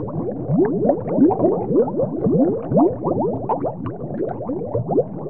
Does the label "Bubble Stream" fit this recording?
Yes